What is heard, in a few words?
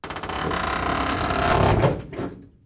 camp floor crk